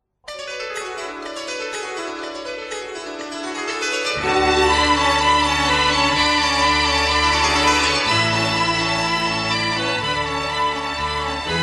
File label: malayalam tone